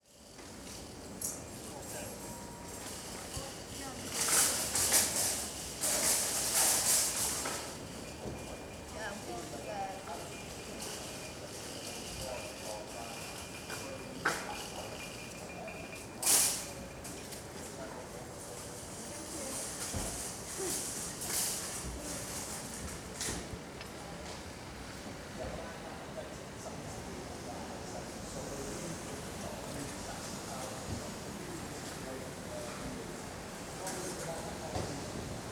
Outside busy supermarket - cars trolleys footsteps chatter